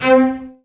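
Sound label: CELLOS D4; ILIO; Synclavier Strings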